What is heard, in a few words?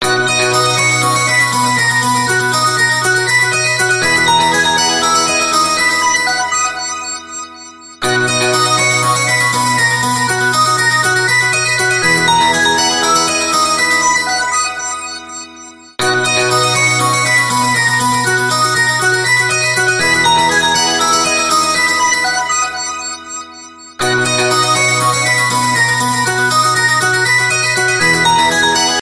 Samsung Galaxy Pro Default Ringtone